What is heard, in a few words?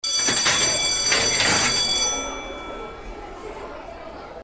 SCHOOL BELL